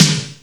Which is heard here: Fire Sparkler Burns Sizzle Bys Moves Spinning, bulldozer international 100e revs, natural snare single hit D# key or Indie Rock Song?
natural snare single hit D# key